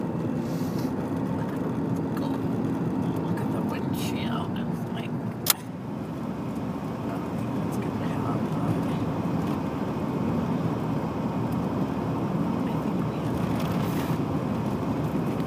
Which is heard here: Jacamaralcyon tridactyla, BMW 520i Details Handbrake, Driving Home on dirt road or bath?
Driving Home on dirt road